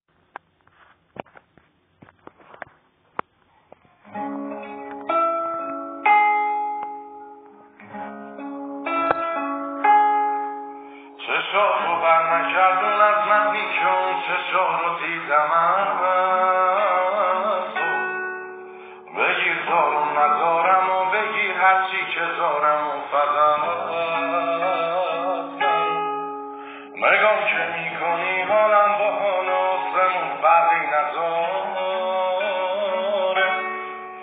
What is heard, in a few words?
Guitar; با گیتار